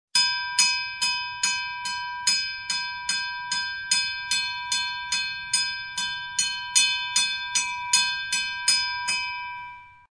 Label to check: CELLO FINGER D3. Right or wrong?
Wrong